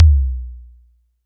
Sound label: mid tom single hit c sharp key; Duration 0:02; Samplerate 44100 Hz; Bitdepth 24; Channels Mono